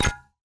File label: Battery Plate Magnetic-Snap